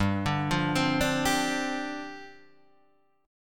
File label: C Suspended 2nd Suspended 4th, G